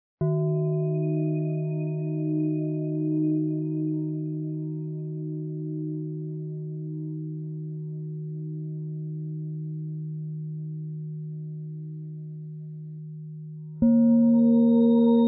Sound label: harmonic resonance